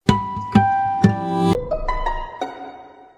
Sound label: amogus harmonic